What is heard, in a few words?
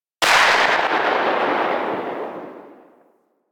Igniter Pistol Tail; Warframe; Weapons; Corpus